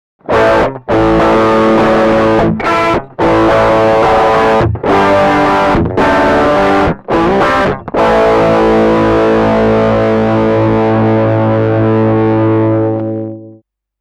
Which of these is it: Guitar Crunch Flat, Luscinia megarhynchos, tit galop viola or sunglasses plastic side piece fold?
Guitar Crunch Flat